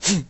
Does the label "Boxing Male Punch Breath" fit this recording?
Yes